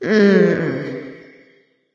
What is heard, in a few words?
disagree light; smite; voicepack; hel